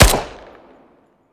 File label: bizon